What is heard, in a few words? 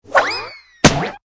hardball impact only